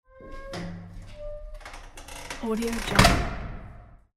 creaking of the door opening with cotton

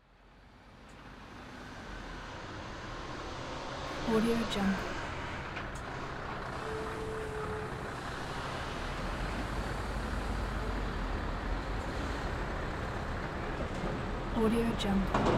industrial dock ambience

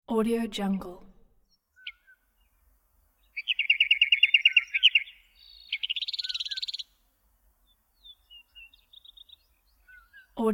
bird tweeting

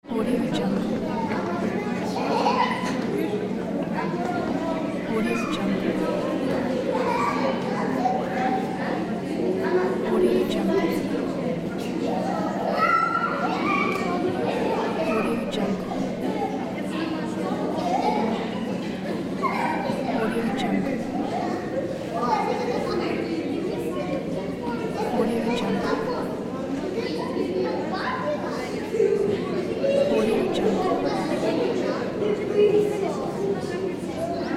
indoor children party

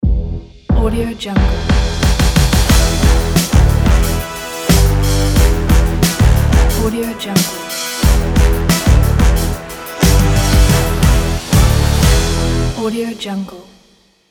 urban logo

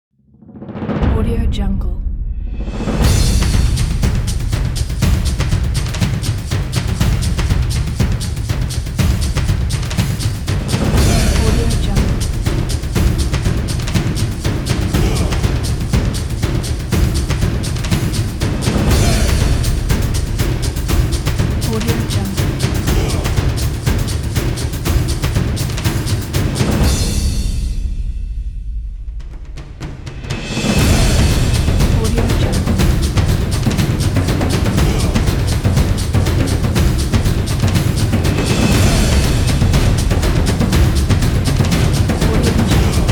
epic sport drums

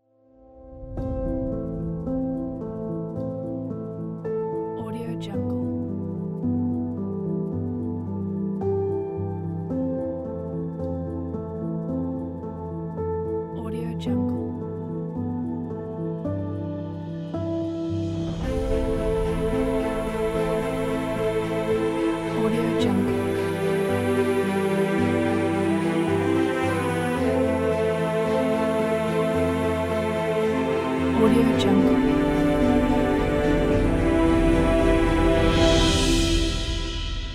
epic background; galaxywave

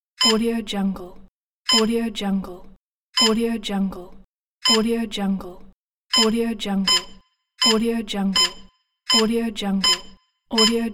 hospital heart monitor beeps; dragospitaru

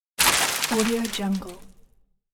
falling rocks; moonaudioproduction

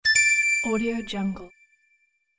correct answer or selection; fascinatedsound

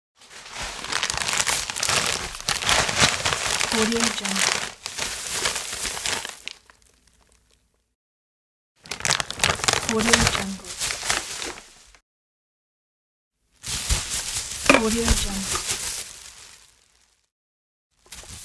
bagging groceries

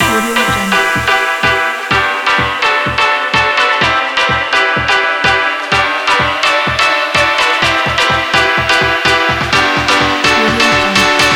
in dance edm

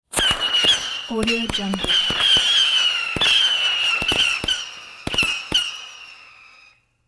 roman candles fireworks pop and scream; hollywoodedge

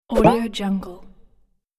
boing jump swoosh

